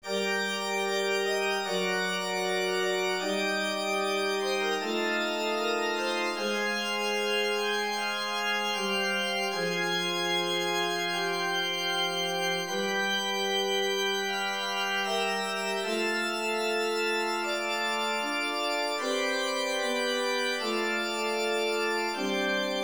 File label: study organ; Easter 2022